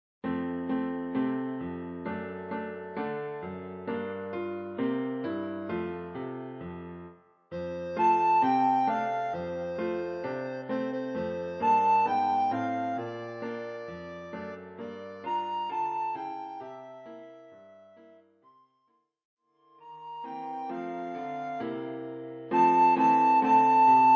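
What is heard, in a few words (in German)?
Jingle Bells Blockflöte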